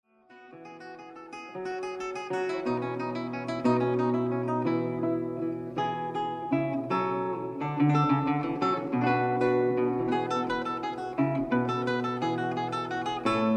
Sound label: Basse Dance, lute; Tous mes amys